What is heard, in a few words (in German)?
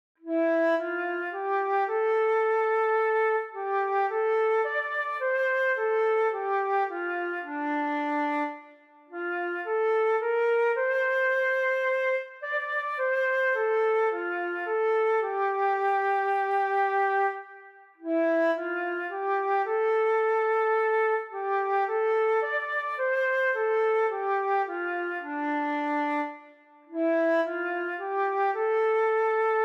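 Danny Boy Querflöte solo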